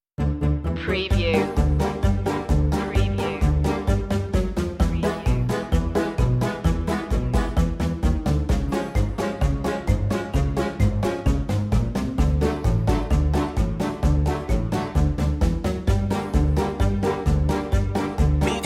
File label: quirky comedy underscore